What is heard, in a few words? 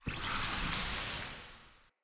Passive Sparks; Fey; Champion